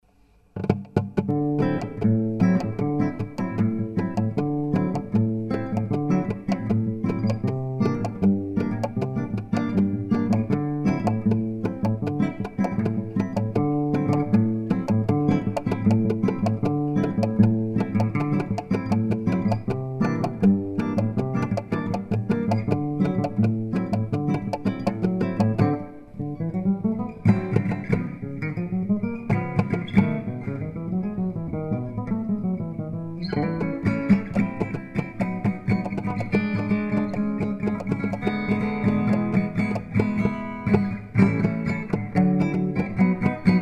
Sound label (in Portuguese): samba